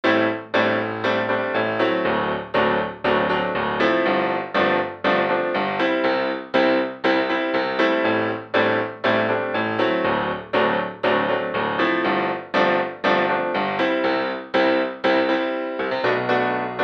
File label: pop london